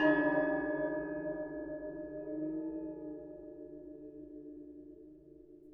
waterphone; Notes

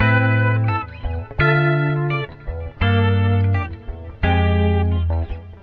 guitar nah naa, Loops, Phonky

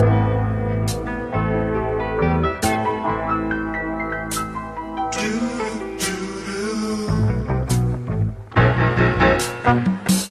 piano doo doo doo doo doo, Phonky loops

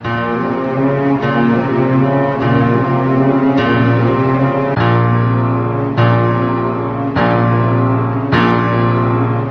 strings and piano, sad; loops

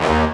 blast, Horns; Notes

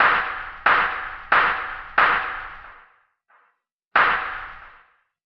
claps, drum loops

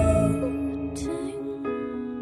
piano, sad

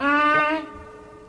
ay rich pry, voice ooh ahh

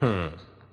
huh male, Voice

